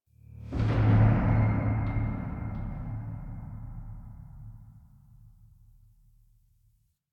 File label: Metallic LFE; Ambience; Warframe; Nineteen Ninety Nine